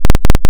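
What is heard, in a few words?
ring modulation sqr sin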